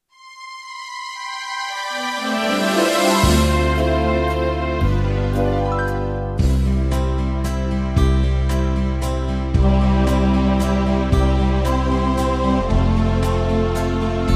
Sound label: Waltz